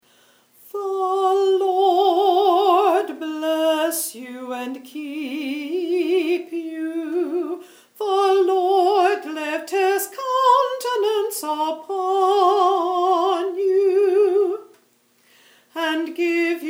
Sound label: Soprano